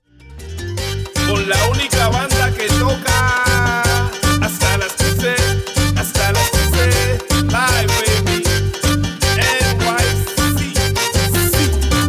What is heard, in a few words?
latin explosion; multitrax